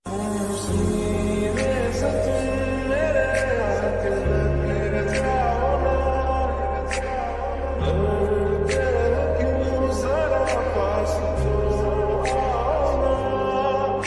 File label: Sad Ringtone